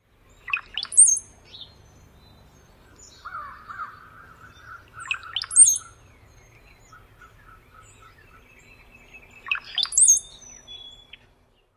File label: male song; NY